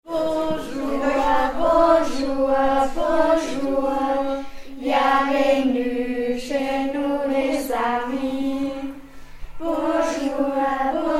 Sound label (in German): Francais Kinder singen